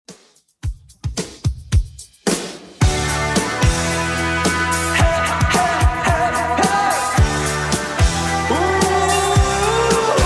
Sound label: 80s Redrum 110bpm